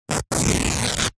foley t shirt thick rip

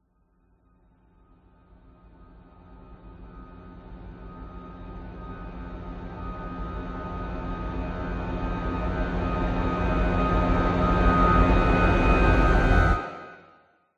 horror riser long dark evil

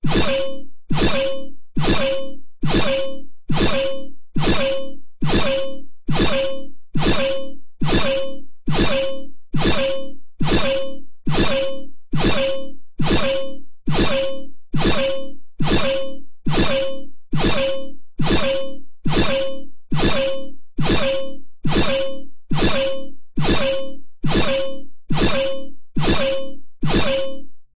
science fiction machine thud loop

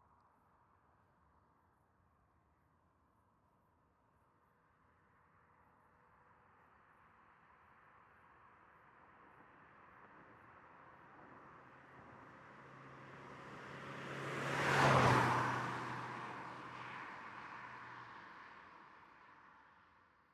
Car By Mondeo 40mph; noise creations

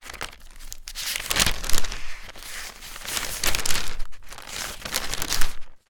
Newspaper Turning Pages

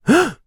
human male gasp shock

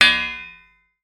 impact metal strip large ground; zapsplat

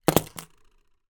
household key ring plastic place on window sill; zapsplat

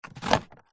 foley plastic dial turn short

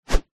warfare axe or other heavy weapon swing

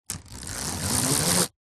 household blind roller pull chord rub clicks

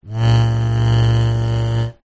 household chair slide wood floor rub squeak

